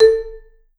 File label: Vibes; Sampleheads - Dave Samuels Marimba & Vibes